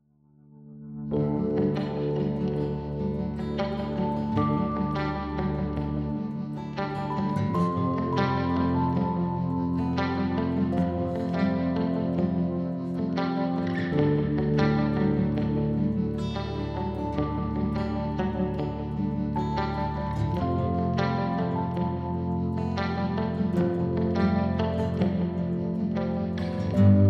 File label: Intermission e-piano